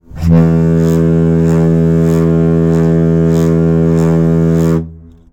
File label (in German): schiffshorn; havila capella